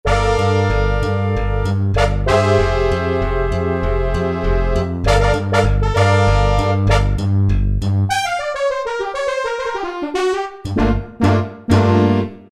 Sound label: Slap Brass, Roland D-50; Factory preset demos